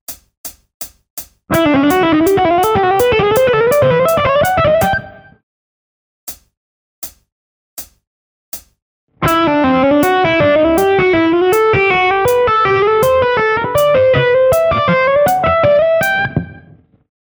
legato

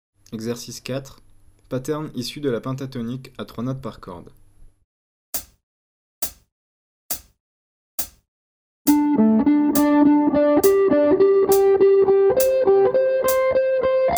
penta 3 notes

